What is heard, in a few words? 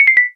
ding down